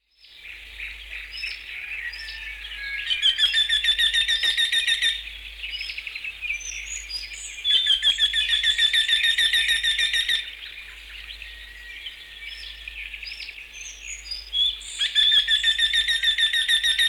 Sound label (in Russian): golosa ptic vertisheyka tokovanie